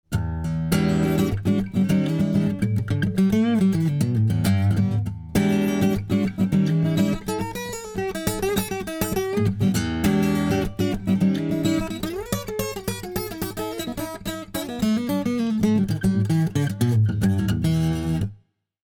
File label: Acoustic Gtr 1176SE